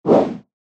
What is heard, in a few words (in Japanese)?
ムチをふるう 通常攻撃